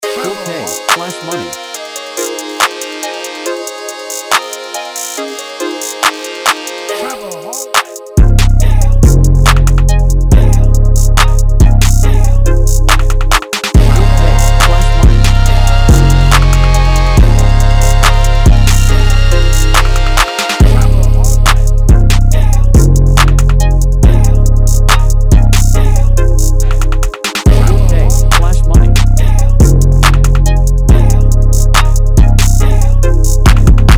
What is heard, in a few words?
beat